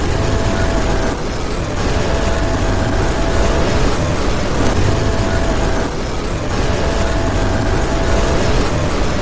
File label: glitch sound; pibby